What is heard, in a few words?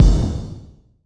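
Explosion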